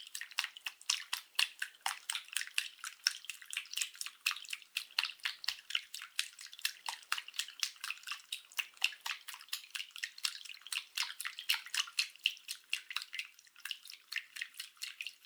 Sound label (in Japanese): ストローク強遅, 水音効果音